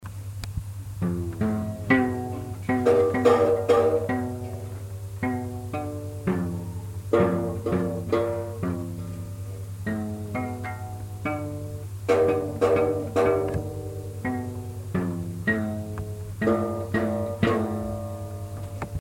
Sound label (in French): Guitare et bassine